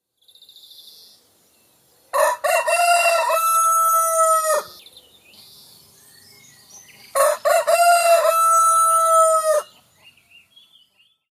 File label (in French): coq; loto sonore; pour les petits; loto des animaux